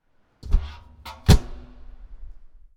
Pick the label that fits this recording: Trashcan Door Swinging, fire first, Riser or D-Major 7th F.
Trashcan Door Swinging